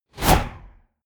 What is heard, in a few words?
swoosh